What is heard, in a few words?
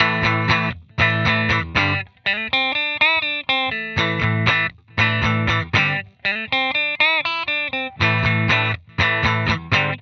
DuoVerbV2